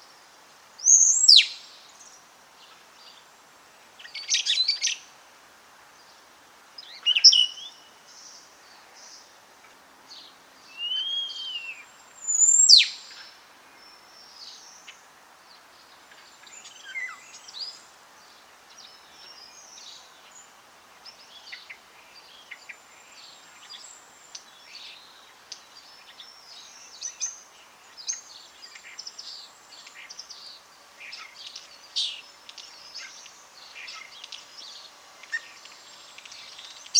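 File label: spotless starling sturnus unicolor, birdsong; peniya ptits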